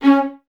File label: String Ensembles, Violas; C#4; Miroslav Vitous; LINKS ORCH